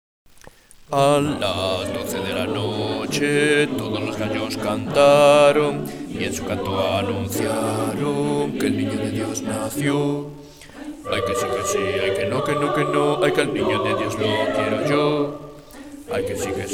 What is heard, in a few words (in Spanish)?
AY SI AY NO BAJOS; navidad